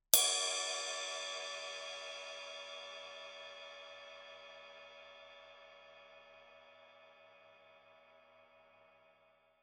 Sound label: Signature Power Ride 20 Body; New Instruments 2021